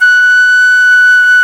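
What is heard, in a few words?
FLUTE PICCOLO 2F; Roland LA; ORCHESTRA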